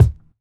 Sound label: boom steel kick drum c sharp key; kick drum samples